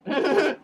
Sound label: snappy laugh, less shrill giggle